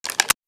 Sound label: ak74u reload lift